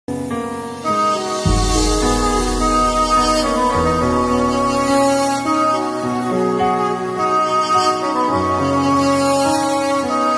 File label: romantic ringtone; ek duje ke vaaste 2